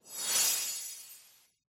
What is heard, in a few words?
win indicate; hades River Of Souls; Fantasma